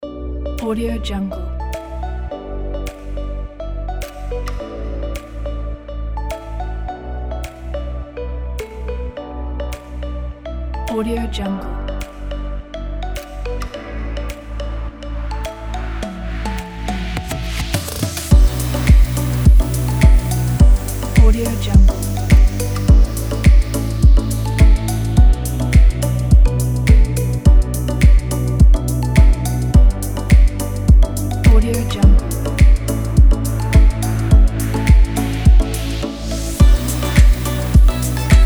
dance tropical smile

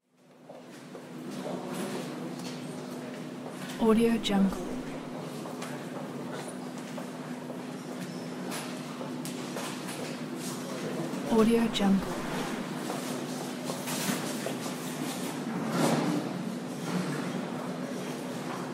hospital; pure-noise

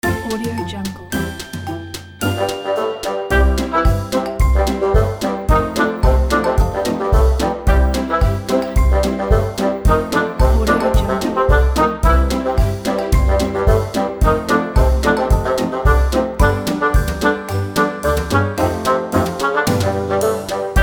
animation and games characters runner; studioetude

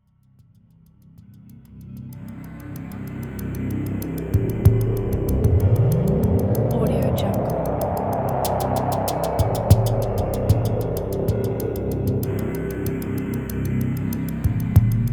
dark industry

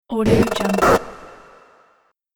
robot servo stutter

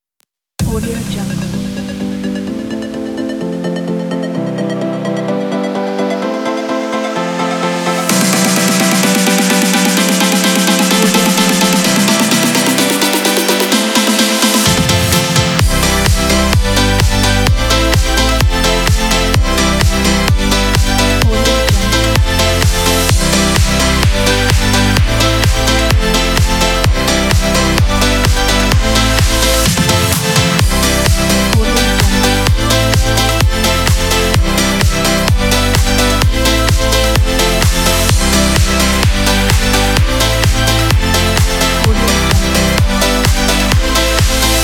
the edm

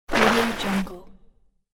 braking skid on dirt

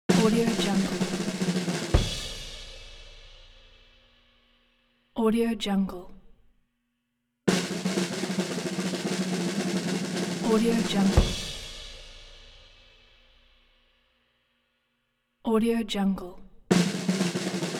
drum roll

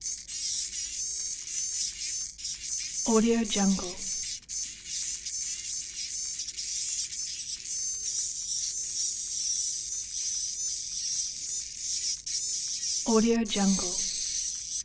servo robot mini toy two leg spinner; soundmorph

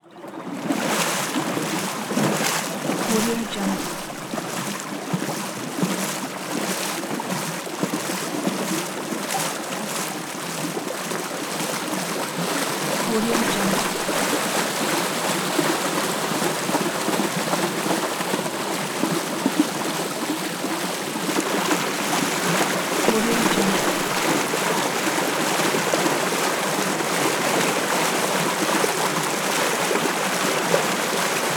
paddle boat paddle

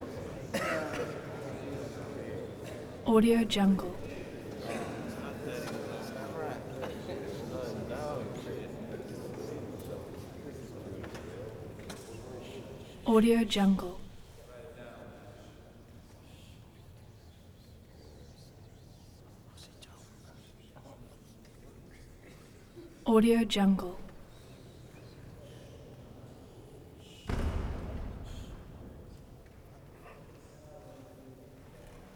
prison crowd reverberant theater

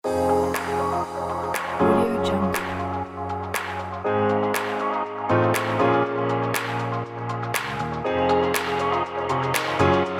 fashion upbeat dance

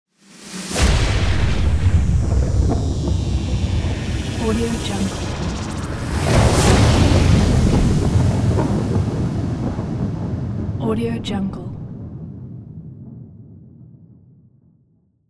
artificial thunder

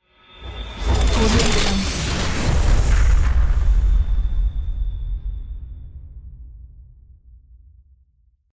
extraterrestrial weapon

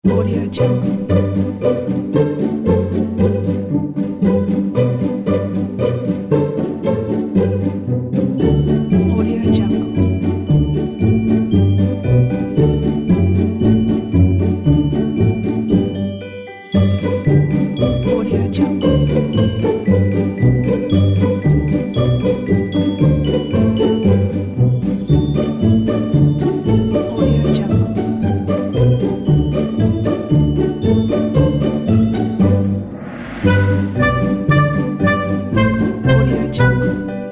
for game music

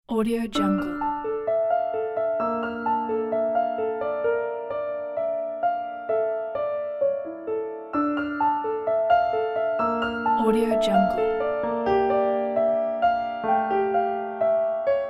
beautiful piano with orchestra

